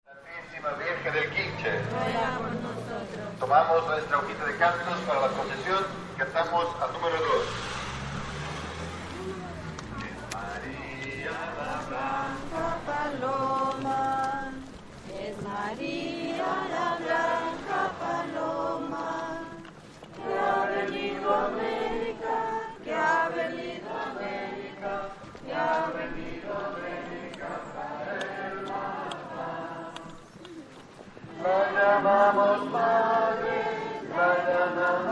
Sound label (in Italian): Processione Ecuador; Es María la blanca paloma; E’ Maria la colomba bianca